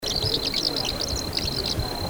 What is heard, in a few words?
Asian Martin, birdcall